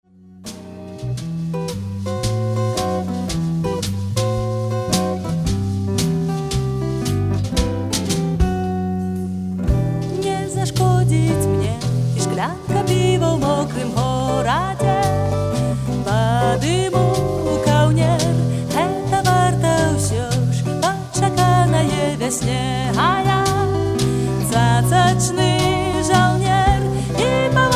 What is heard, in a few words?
jazz